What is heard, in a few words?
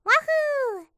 wahoo